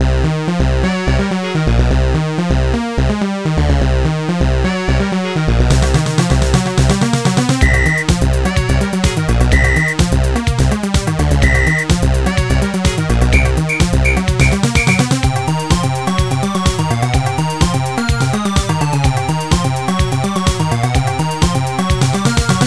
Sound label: Techno Rave; Incredible Machine 2